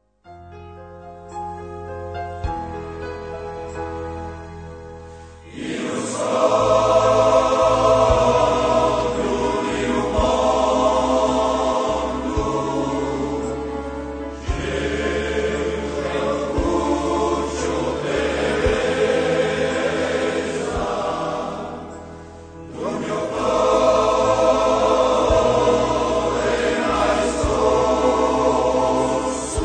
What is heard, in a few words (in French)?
chant corse; corsica